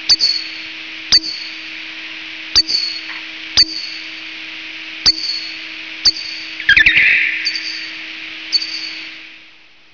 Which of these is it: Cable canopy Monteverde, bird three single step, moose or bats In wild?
bats In wild